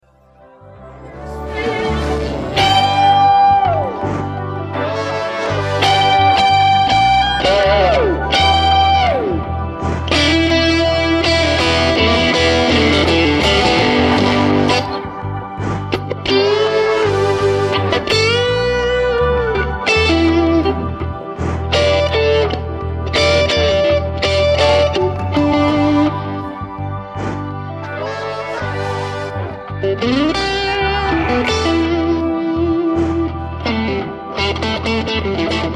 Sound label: solo extraction dry